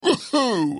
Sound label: switch multi; captain